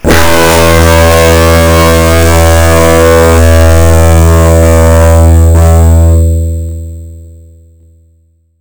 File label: low e, guitar, single notes, dist1